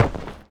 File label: grenade dirt